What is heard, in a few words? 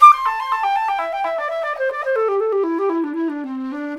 flute, disco